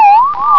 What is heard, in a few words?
Transmit; Galactic Frontiers™ 1.0.2